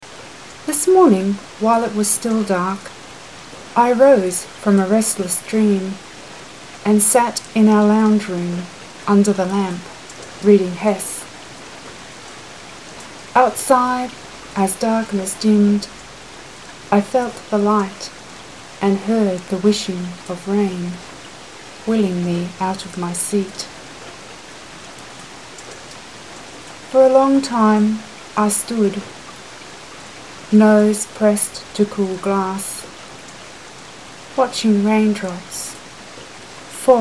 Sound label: The Whishing of Rain (with rain)